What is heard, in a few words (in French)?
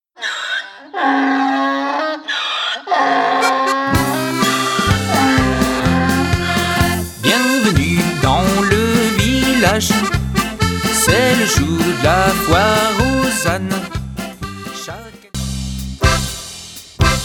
L'Accordeon s'promene